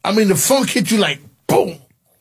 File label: funk